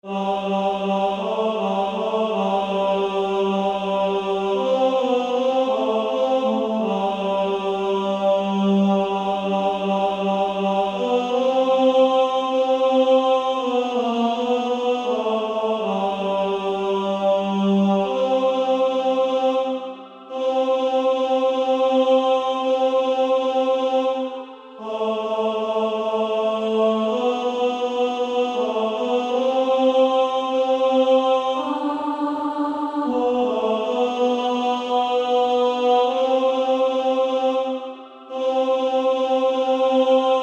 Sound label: Female Choir